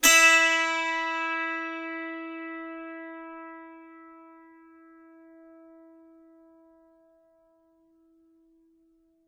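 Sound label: E4, dulcimer pluck; SoundBytes; Early Patches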